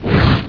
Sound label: dragon strike fire